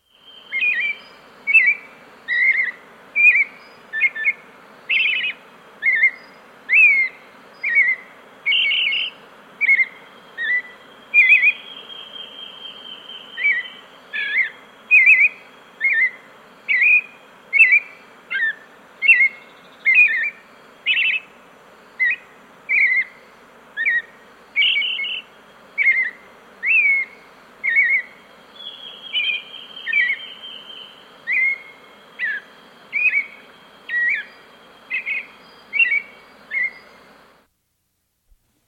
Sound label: Pale-Vented Robin, S. America